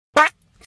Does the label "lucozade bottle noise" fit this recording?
Yes